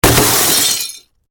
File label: Impact Crash Box Drop Glass Debris; Crash Sound Effects; Crashes (Sound Effects:Objects); SFX Bible 6